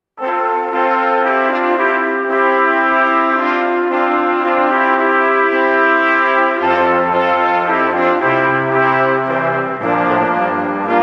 Brass

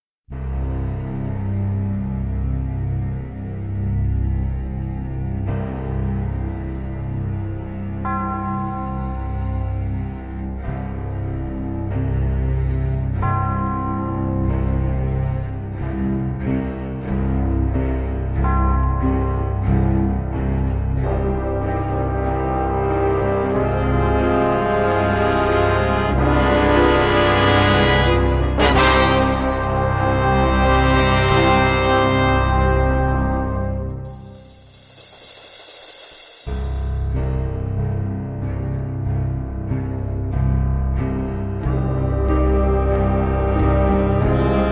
Introduktion, Strings Brass

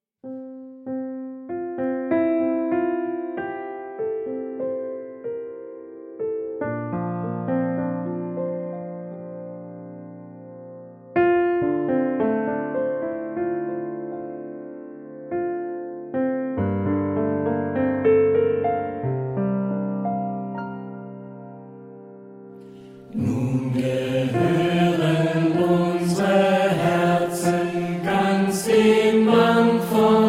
Tenor

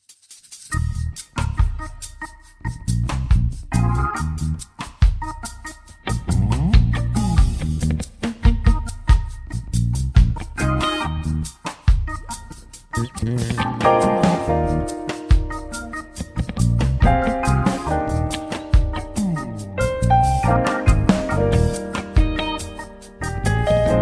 Bass

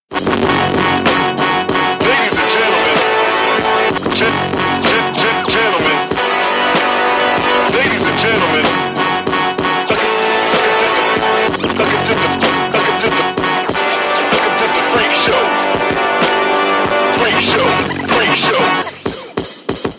Fanfare Introduction

